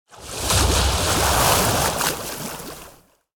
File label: Water Underlay Death